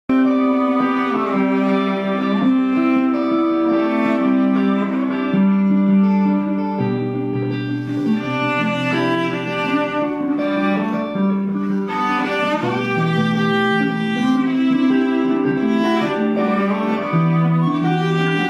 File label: strings